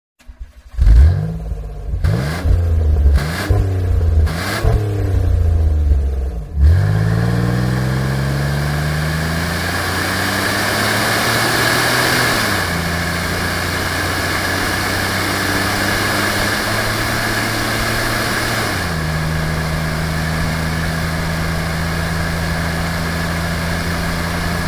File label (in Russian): peregazovka avtomobilja